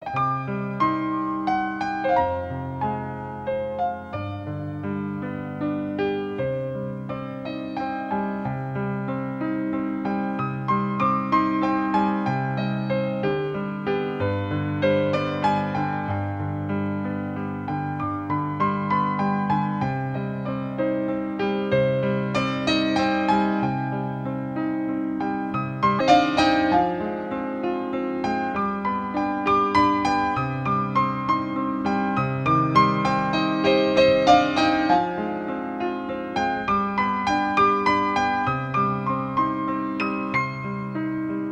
Piano Solo

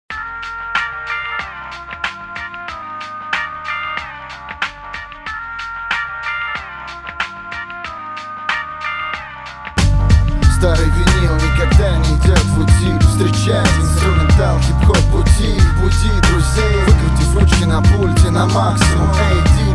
Instrumental

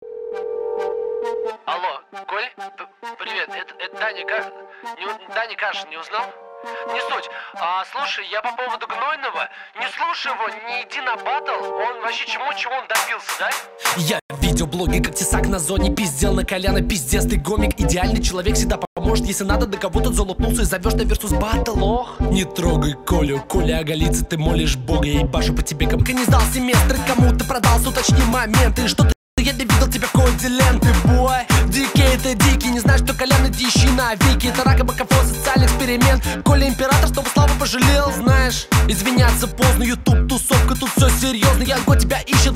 Hip hop

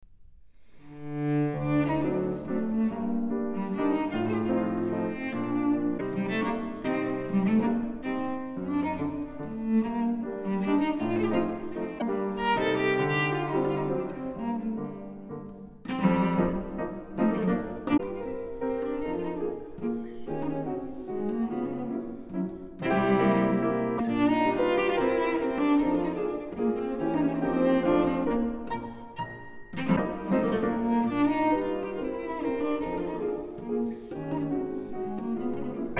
Mazurka in G Minor; Frederic Chopin; Op 67 No 2

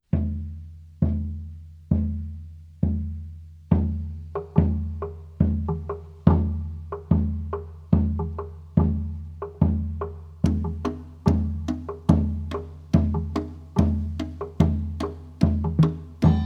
Percussion; Coronation

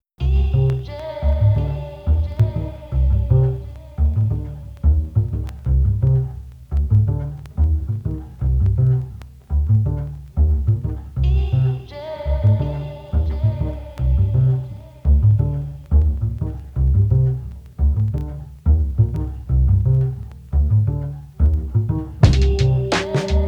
Instrumental hip-hop

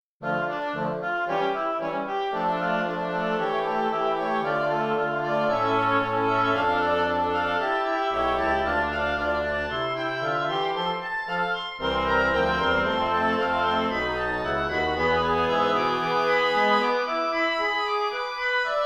Instrumental

